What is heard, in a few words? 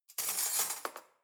cutlery knives drawer place